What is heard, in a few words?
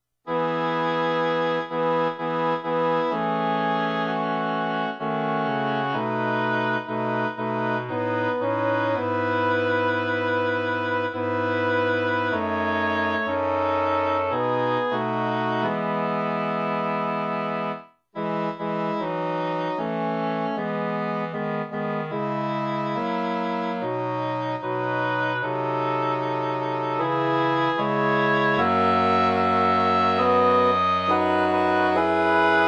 Nunc Dimittis Soprano, choirs; Luvaas; Reunion 2025